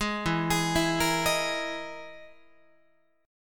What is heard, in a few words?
E-Major Sharp 11th E, Guitar; EM#11 arpeggiated; 11,12,11,14,14,14; JGuitar